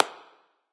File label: clap; smite; odin